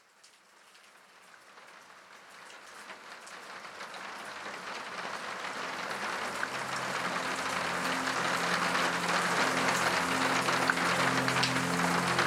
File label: Tranquillo Rain, spa nature